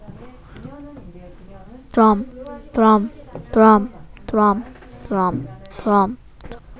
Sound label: drum